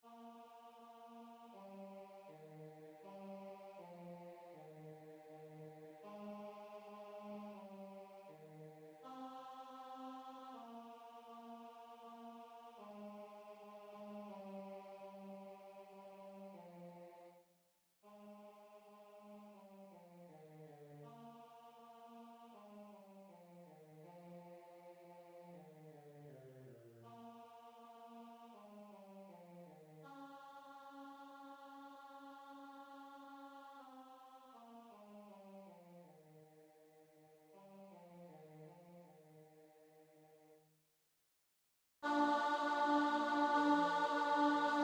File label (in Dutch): alt, benedictus